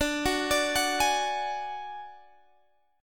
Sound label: D Major Flat 5th D Guitar Half Step Down, chord